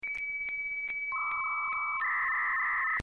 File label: internet sound